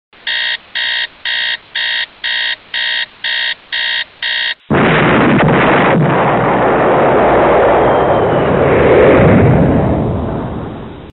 Time Bomb

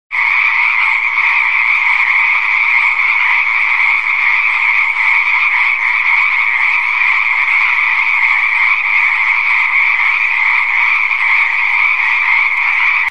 Freezing Frogs

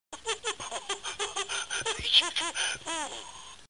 A Quick Lil Laugh

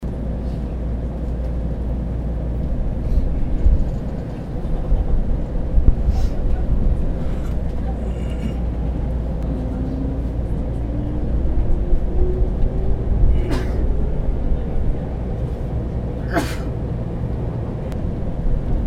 Street Noise

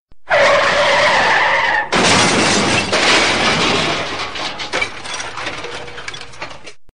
Bad Car Accident

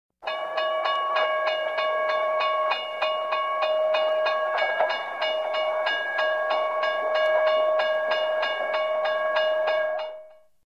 Train Crossing

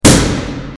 Gun Shoot; Michel Hollicardo

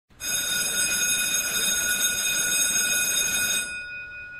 School Bell

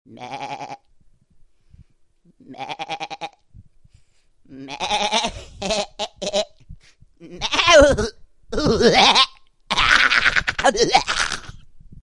Mad Goat

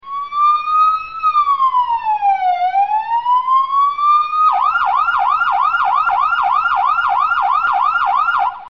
Police Siren

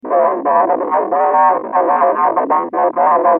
Broken Bow

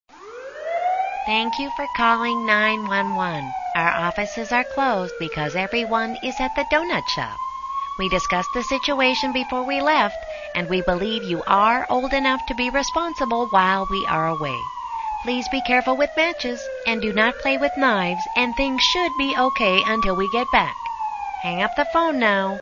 Police 911

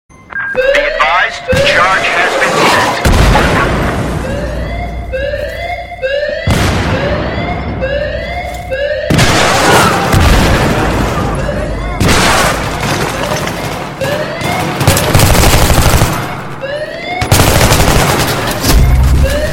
Battlefield Alarm

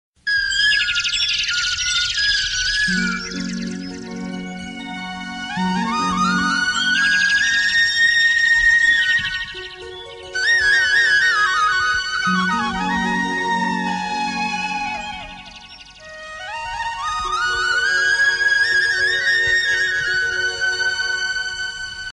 Beautiful Bird Sound

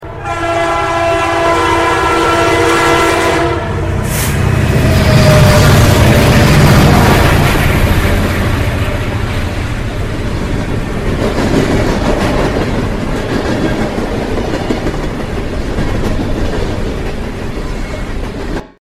Train 3d Sound Ever

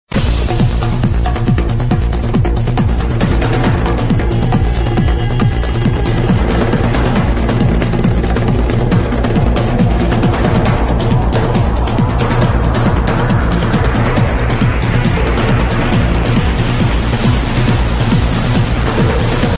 Drums Mixed

